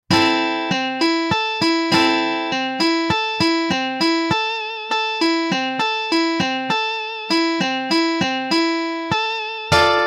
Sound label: Arpeggio Solo; solo techniken